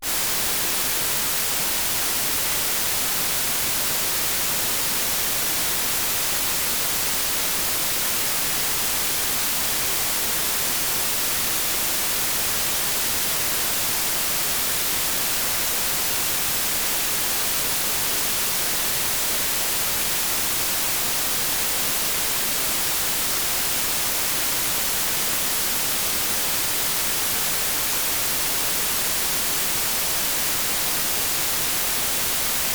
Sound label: Noise Measurements; Rohde & Schwarz; UPA; Rohde & Schwarz UPA-CD